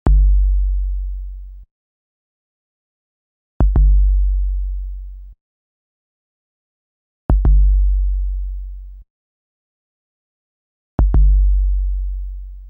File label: Sub Drum